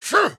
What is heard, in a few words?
Attack Small